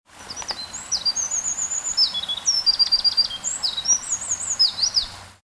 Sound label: winter wren